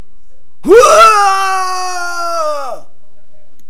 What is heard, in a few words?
scream